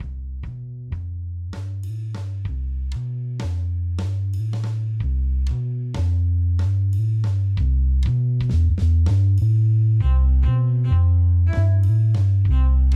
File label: strings And Horns